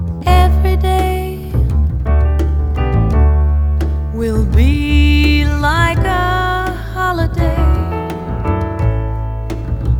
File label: blues